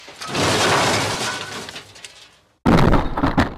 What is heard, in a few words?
car crash bentley